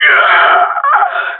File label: ct death; Counter Strike Model Pack; CS:GO; gsg9